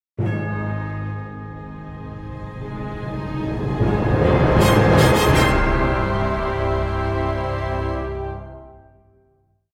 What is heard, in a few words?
Victory; hearthstone heroes of warcraft